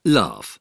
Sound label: laugh; lɑːf/ /læf